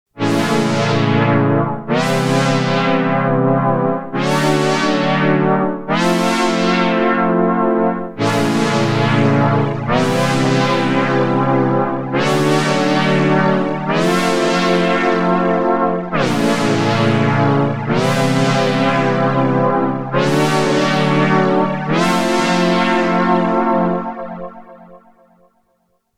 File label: KORG Lush Chord; KORG Isomer